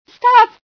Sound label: pain, female sci